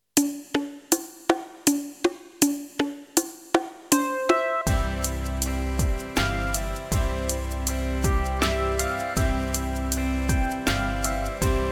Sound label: bongos